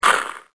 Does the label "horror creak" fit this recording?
No